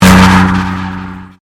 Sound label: Convolution Pulse